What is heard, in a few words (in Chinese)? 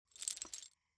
铁链